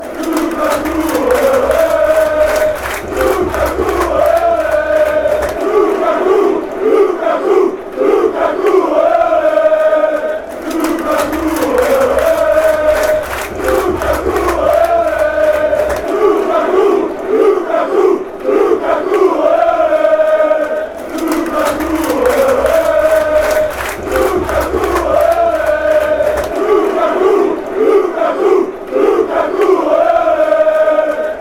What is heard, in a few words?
anderlecht chant